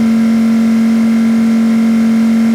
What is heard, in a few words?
Viper GTS Loop, Automobiles